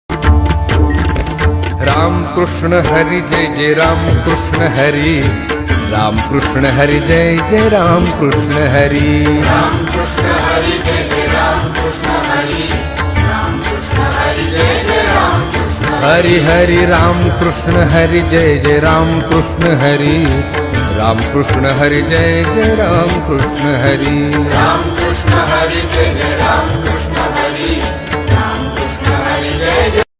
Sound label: Ringtone Marathi